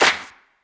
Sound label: clap single shot f sharp key